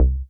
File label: Bass